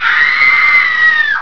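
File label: scream female; PEOPLE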